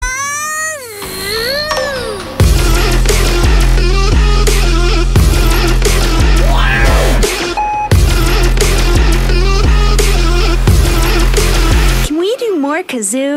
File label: kazoo; taunts; stompinggrounds; props